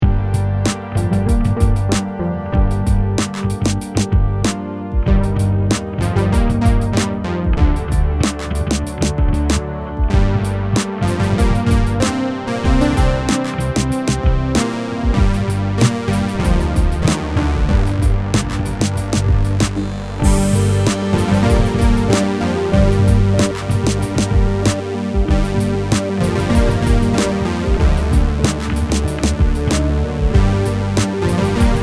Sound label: chords synth; star wars